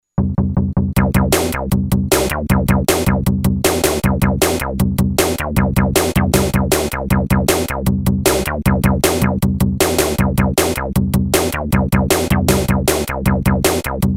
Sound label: Gray Victory